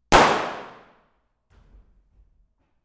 Gunshot Stage Pistol; Soulpepper

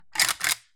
AK 47 Action Rack

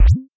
Player Jump

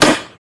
M203 Grenade Launcher

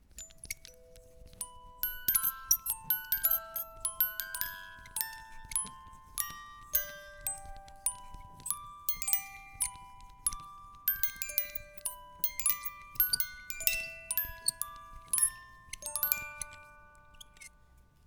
Music Box

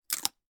Chewing Breadstick Single

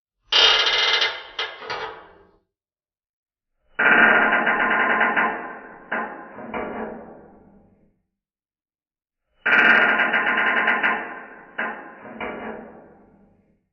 Creaking Metal Spokes Of The Bicycle Wheel; Klankbeeld

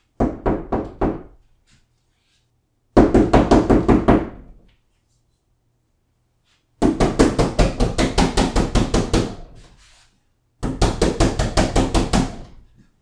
Knock On Door

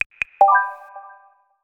Proximity Notification

